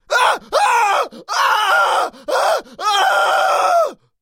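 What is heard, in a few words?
sounds with scream of burning person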